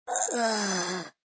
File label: Fem Death